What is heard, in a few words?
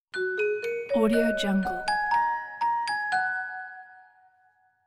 clavichord chinese accent